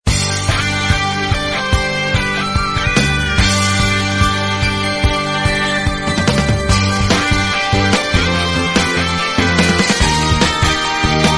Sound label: android ringtone